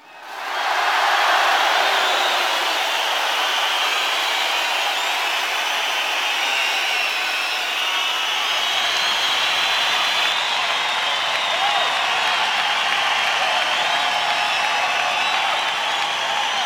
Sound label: applause crowd outdoor; best service Blue Box